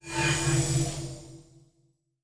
Teleport, Sci-Fi Sounds

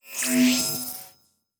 Shield Device Start, Electric; Sci-Fi Sounds

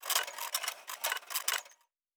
Metal Tools

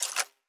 Weapon Foley (Laser); Sci-Fi Sounds

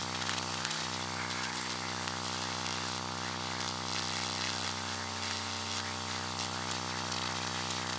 Hum Loop, Sci-Fi Sounds

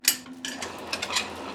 engine start, sci-fi sounds, mechanical

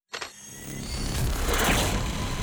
Weapon Load (Laser); Sci-Fi Sounds

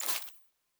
Device Stop, Electric; Sci-Fi Sounds

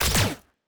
Weapon Shoot

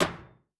Metal Box Impact; Sci-Fi Sounds

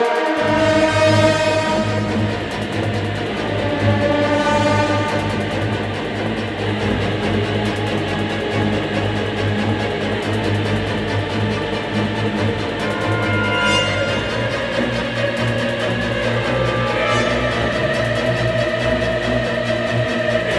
Action, Loop, 140BPM, Classic Horror Sci-Fi Music; Dead Ark

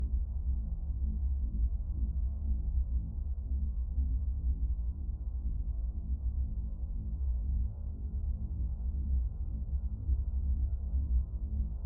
Low Rumble Loop, Sci-Fi Sounds

